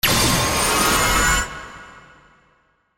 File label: witch laser